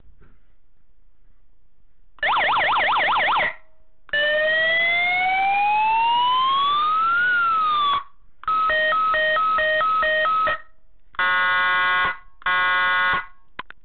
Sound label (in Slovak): siréna 12v 4 tóny s riadiacou jednotkou (výstražný systém)